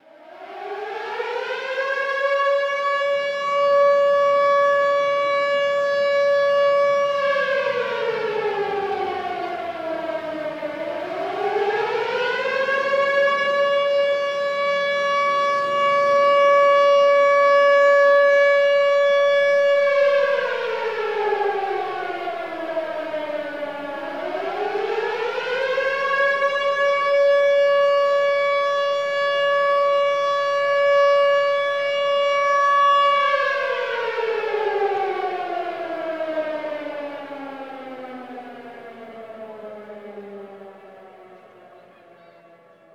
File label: Tension Builder